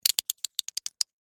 Legos Snap Together, Foley, Legos, Snap, Together, Fast, Build, Plastic, Toys